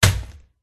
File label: raven attack contact middle